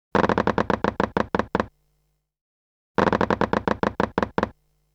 Dice; string quartet

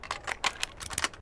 Lock Pick Tumbler Moving

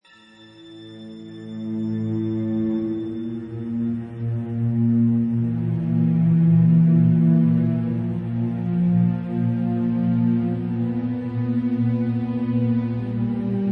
Evil Ambient; windows

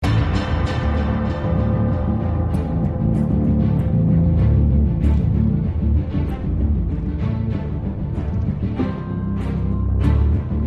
Loop Pause Menu; tales from the borderlands gamerip 2015

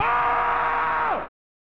Scream

